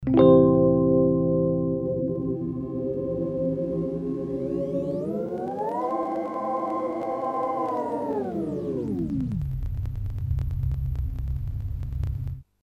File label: Teenage Engineering TX 6 Freeze